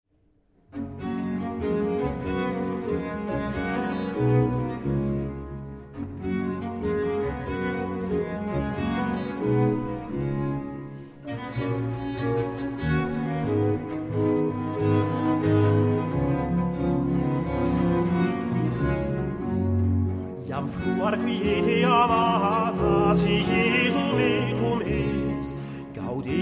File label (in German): Aria mit Cello; Anonym